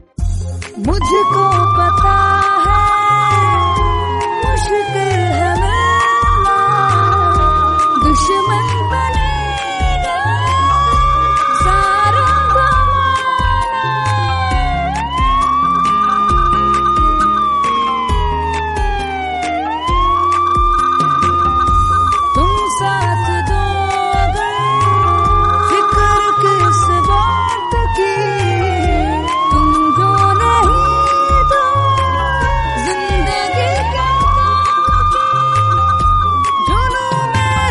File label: sirene mix